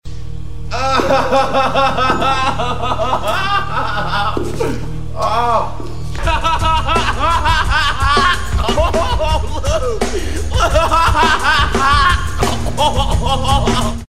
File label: crazy laugh